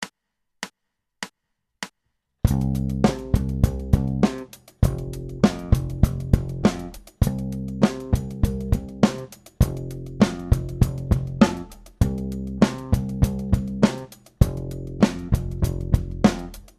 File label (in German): Slap Grooves, four strings